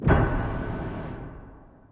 stonefeather armor; dota

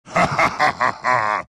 laugh; dota; tiny

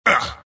grunts; dota; skeleton king

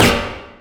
battery assault launch; weapons; dota; rattletrap

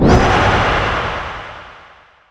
wingfall; weapons; dota; omniknight

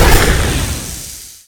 venomancer venomous gale impact

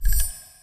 rollover, ui; dota

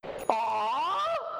aaaaah; ti12

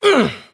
pain; davion; dota; dragon knight

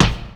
attack; dota; marci

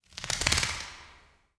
bat flaps; ambient soundscapes; diretide batflaps; dota

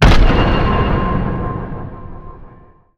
hammer impact; weapons; celestial; dota; dawnbreaker

